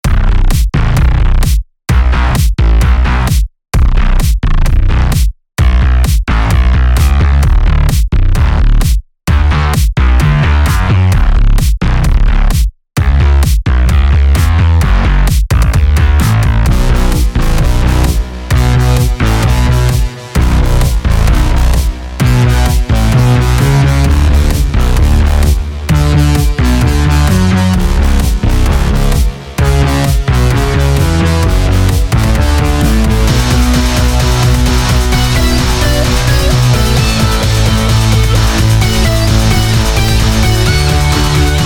booty clap groove; weeklybeats-2020

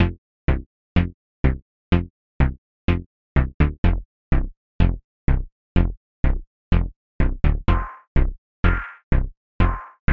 bonk bonk

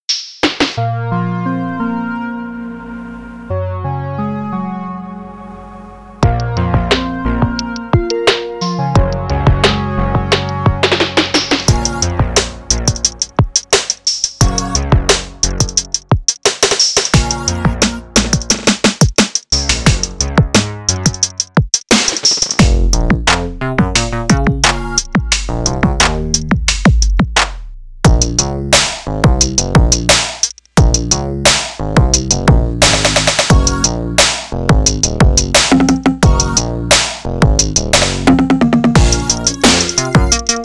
tekdrum; weeklybeats-2020

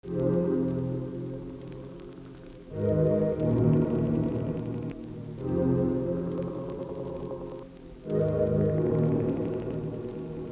ambient; weeklybeats 2024

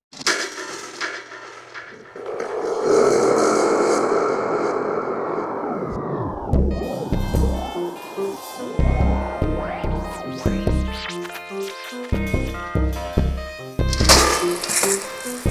foley asmr remix; detroit basket schenks

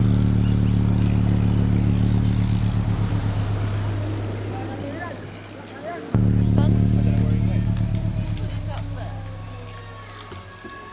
soft white noise; weeklybeats-2014

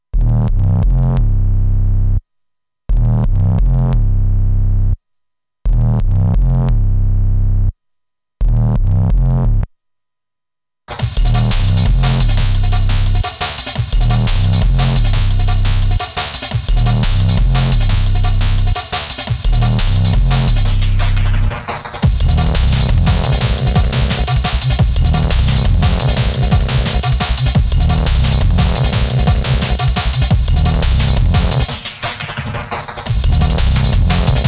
one skipped drill nanoloop2 lsdj; weeklybeats 2026

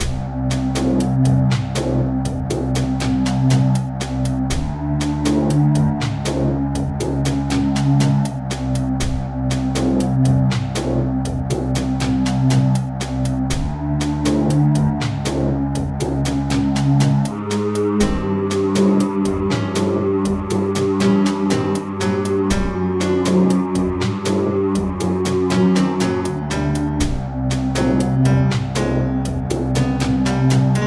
forest temple

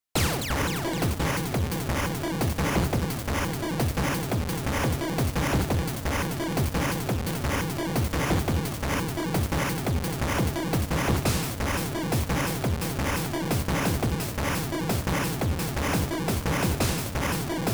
lsdj reese dnb beat; weeklybeats 2026

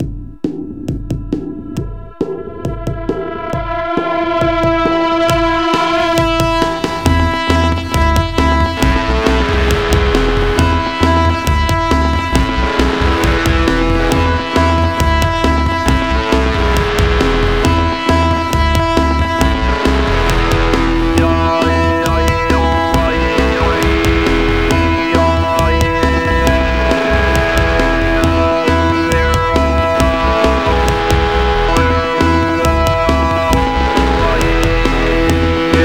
iphone running pocket guitar op-1; machine wars iii; soundgin controlling ginsing; weeklybeats-2012

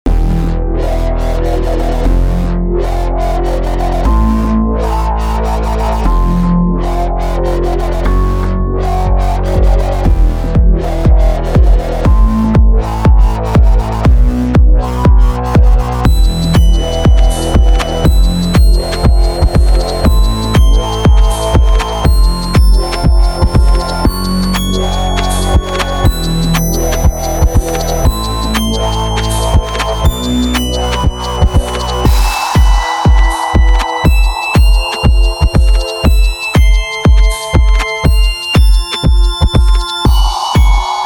neo retro power dance

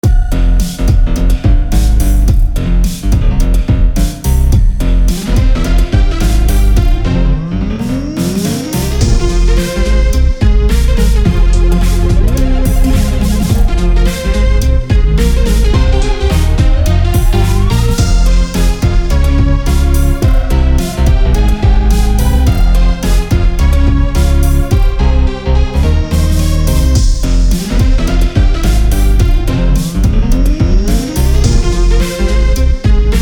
glitchin

